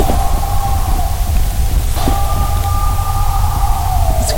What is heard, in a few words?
Howls; Ohio County